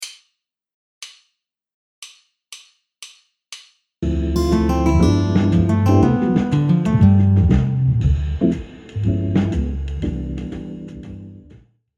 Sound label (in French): ex arp HS ii V7 I ∆ en C Maj, guitare jazz